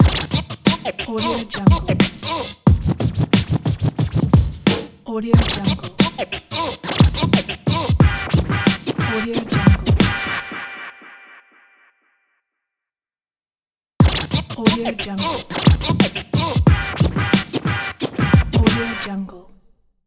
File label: old school hip hop logo